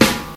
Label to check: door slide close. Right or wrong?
Wrong